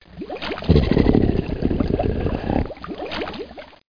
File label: CREATUR; DFDEMO